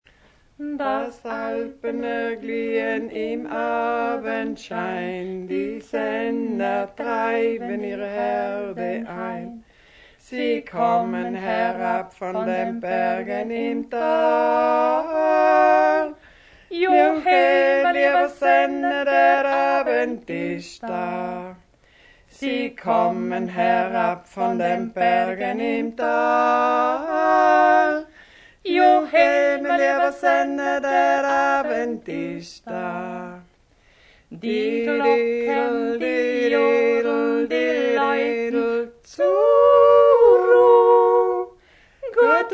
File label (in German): Glocken